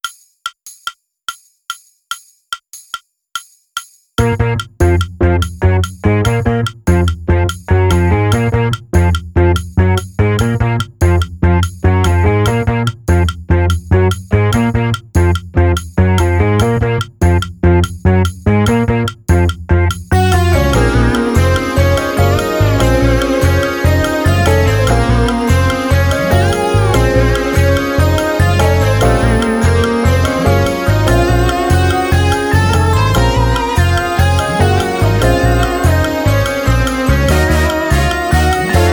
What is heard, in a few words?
Time Rift Fusion drumless